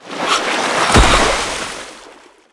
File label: washer jump